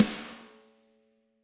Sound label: Snare Hard